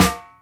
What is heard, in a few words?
helper, snares real